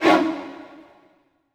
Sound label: HIT, Orchestral; Orchestral Loops; 128 PSYCHO